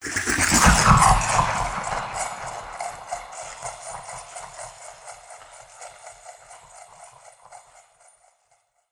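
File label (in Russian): быстро вращайте свист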